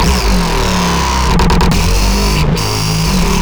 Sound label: 140 A BASSLINE, Dubstep